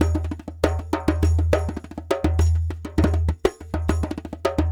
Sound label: Lg Dumbek